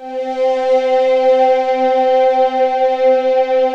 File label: VOCODING C3; USB Soundscan vol.28 - Choir Acoustic & Synth; AKAI